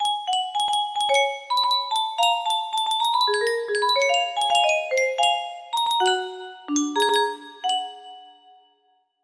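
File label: music box melody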